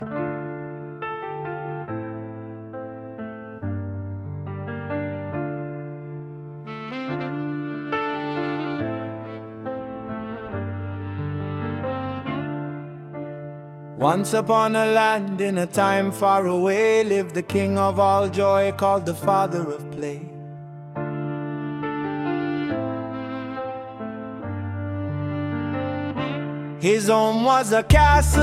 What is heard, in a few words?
reggae